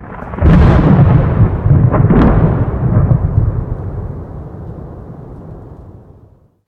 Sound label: thunder; garrysmod; stalker